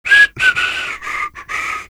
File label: fish whistling, fisherman; lostcoast